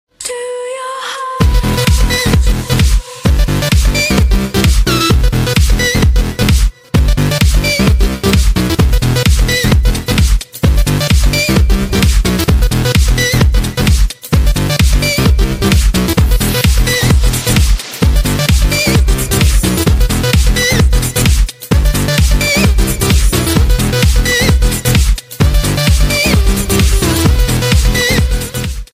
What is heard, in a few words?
samsung ringtone